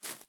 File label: Footstep Gravel